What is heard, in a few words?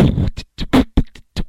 boowatitta, Beatbox; Drum loops